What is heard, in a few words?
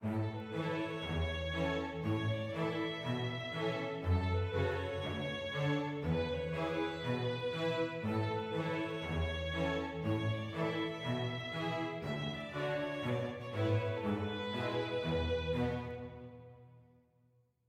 happy med; Quivr; PS4 Windows gamerip 2016